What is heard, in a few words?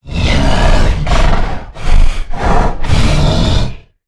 Pain Heavy Breaths; Evolve; Goliath